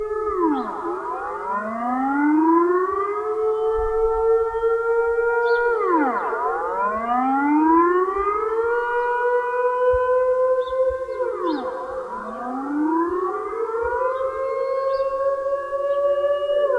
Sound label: Dutch Air Raid Warning; Denoised